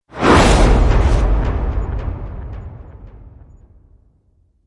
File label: Hit Swell; Ninja Tracks